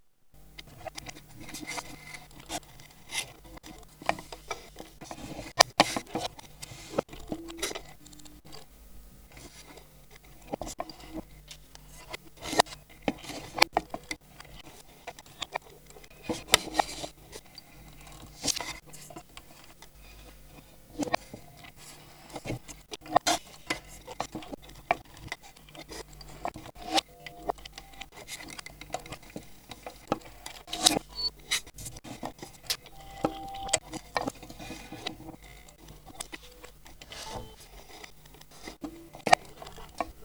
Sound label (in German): Samples Nebengeraeusche algorhythmisch, spieluhr; komposition fuer spieluhr